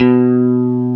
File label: E-Guitar, STRATO